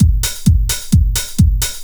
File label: drum 130 straight; Techno Trance Essentials